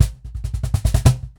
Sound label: Cajon Fill; percussion; musicradar